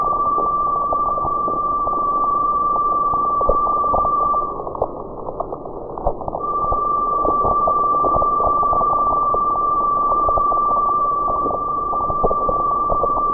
OMEGA tones and tweeks slowed 5 pct, auroral chorus; Puna HawIsl Hawaii sept-1989; SPMcGreevy